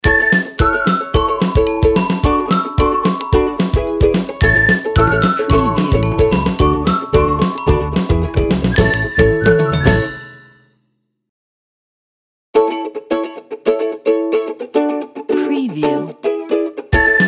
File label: ukulele folk logo